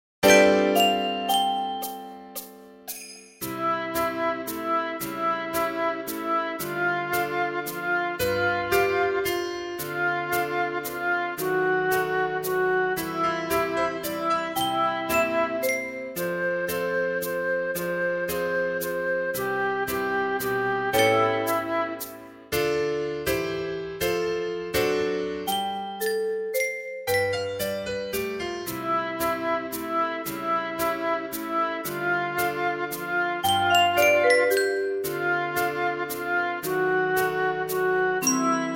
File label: Spirit Of Christmas ACCOMP MID